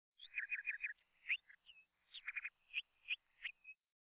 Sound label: Bird; UltimateFishing